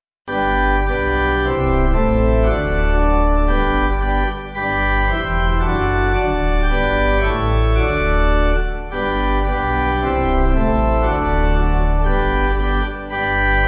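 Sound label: Pipe, Pipe Organ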